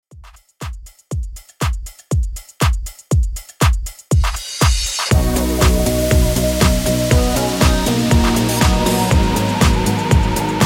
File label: Extended Mix 120Bpm; djyan